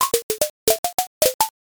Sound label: blocks collapse